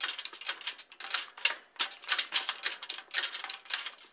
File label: Roll Library Cart Wheel Loop; SFX Kit; Foley - Misc